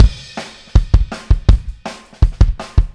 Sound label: Kick, Drums, 162 BPM; Take Your Toms Off